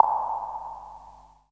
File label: PING PONG; Q Up Arts; Dream Experience